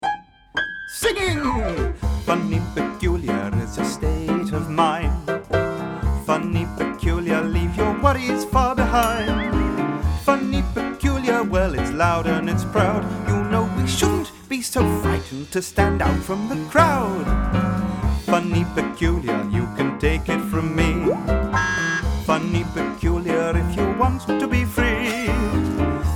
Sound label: funny peculiar song